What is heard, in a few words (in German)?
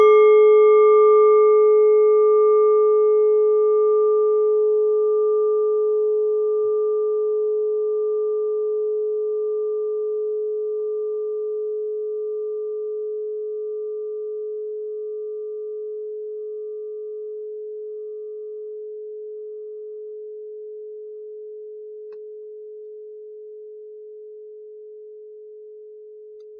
klangschale nepal, Klangschalen Nepal